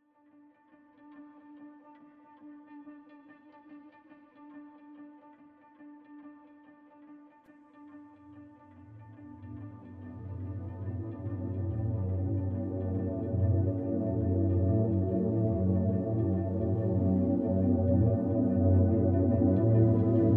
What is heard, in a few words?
ambient